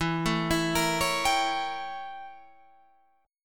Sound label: A 7th Flat 9th E